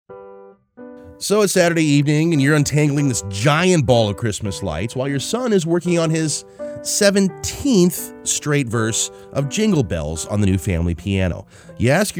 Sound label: PIANO